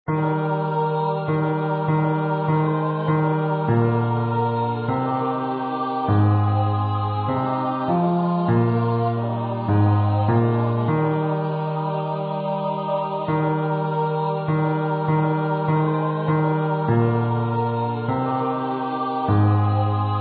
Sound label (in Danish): En rose sa ring jeg skyde i Eb Bas